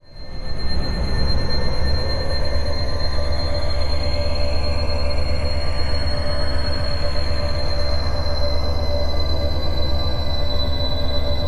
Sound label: Transitional